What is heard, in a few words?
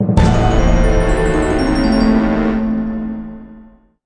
Loser Sound